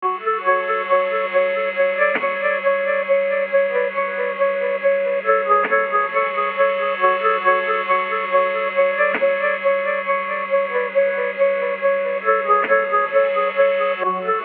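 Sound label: 137 D flat minor, samples; CRATES & BREAKS VOL.1 - BEEJ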